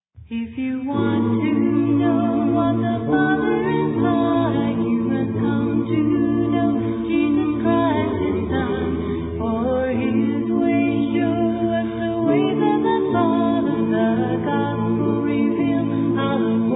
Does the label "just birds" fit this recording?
No